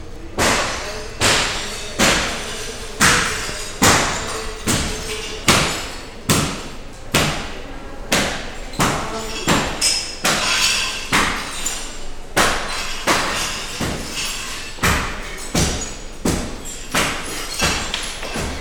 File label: Pulling Down A Wall